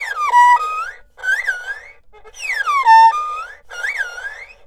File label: ERHU BIRD; INIS - Opium; ERHU MAGIC